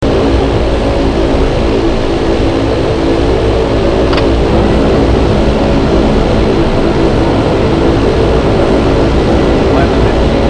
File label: Interior, ATR-72; synchrosoft; With F1 ATR-72